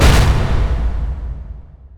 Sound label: enchant; weapons; dota; earthshaker